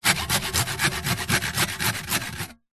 industrial hand saw sawing wood hollow fast pace short